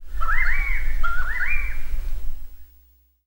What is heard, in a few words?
Kurrawong Call in D